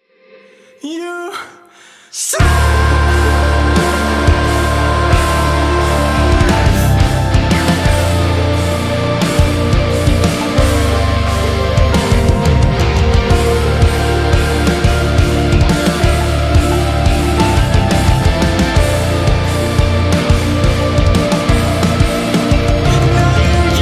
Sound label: buzzer bass; polarity audio